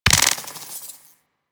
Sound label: Mortar Mine Drop